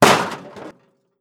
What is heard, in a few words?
stone CRASH